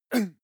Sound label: male groan pain very short; menschen; schreie